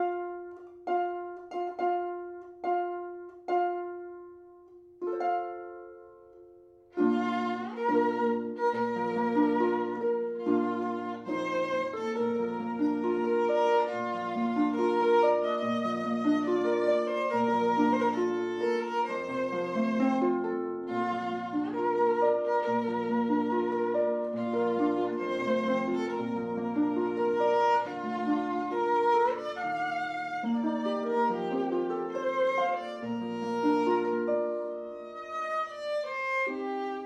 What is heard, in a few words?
Bridal Chorus, harp